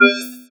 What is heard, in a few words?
change item